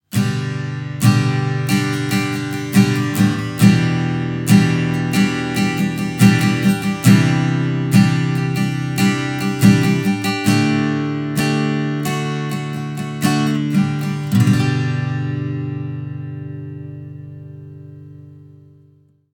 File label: STRUM